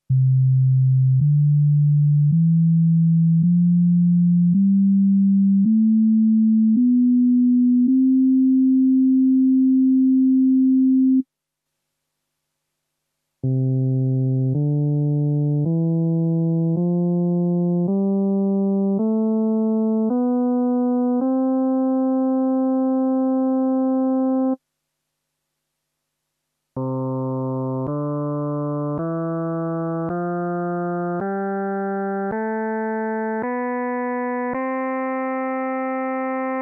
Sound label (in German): klaenge tongemisch